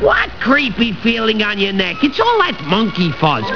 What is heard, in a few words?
monkey fuzz; "What creepy feeling on your neck? It's all that monkey fuzz."; Iago